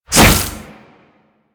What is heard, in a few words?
Briefcase Scythe Whoosh A; Crp Briefcase Scythe